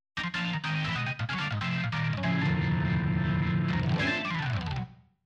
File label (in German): Roland Fantom XR Orgel